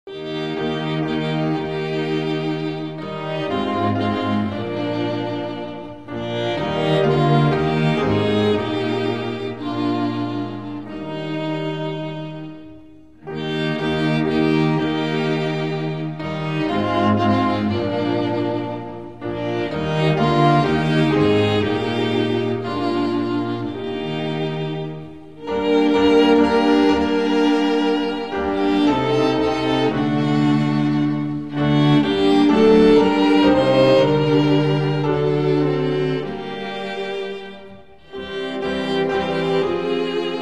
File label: instrumental; hymns spanish